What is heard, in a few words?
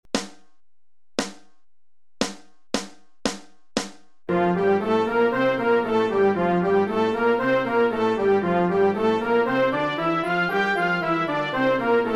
Scale Flow